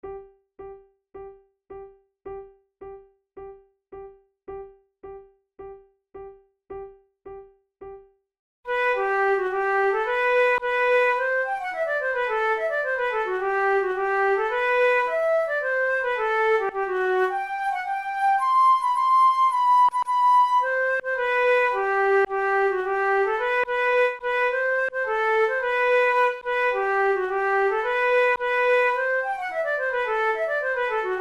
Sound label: duet, flute